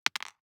DICE on Cardboard, Throw and Roll, Mini, 1 One Die; Samples; ArticulatedSounds; DICE Sfx Party (Extended)